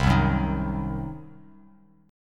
C Diminished C, Bass Standard; strummed; JGuitar

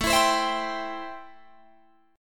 A-Minor 7th A Guitar Drop D

